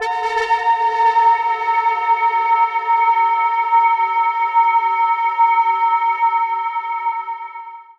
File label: Steampipe Ghostwhistle G 3